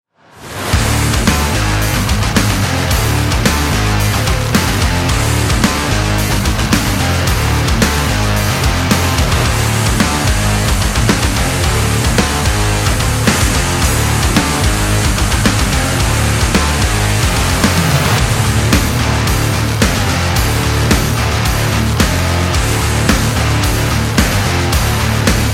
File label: Modern Metal Guitar; action metal and rock music